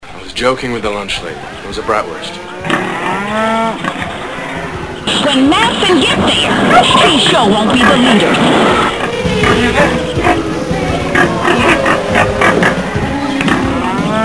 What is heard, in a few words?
Vomitgrowl Blues